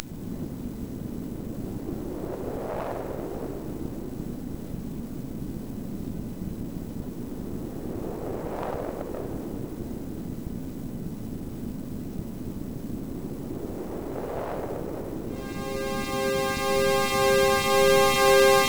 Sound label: Techno; MOS6581R4; hvsc